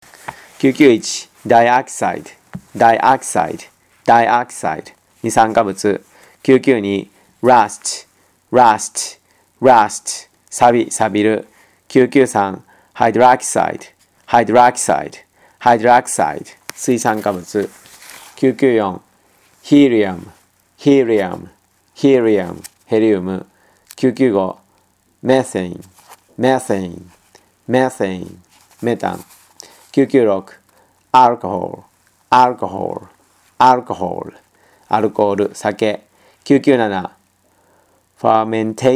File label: tango